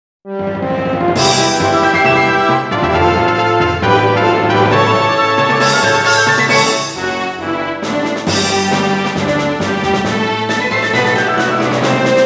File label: Championship Jingle; Monster Farm 2